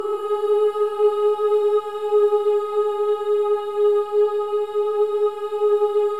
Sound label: Boys; Spectrasonics; Symphony of Voices